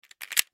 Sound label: Reloading Weapon Sound